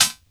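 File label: hi-hat, Drum & Bass, 160 BPM; Ueberschall; Drum & Bass Flash Trackz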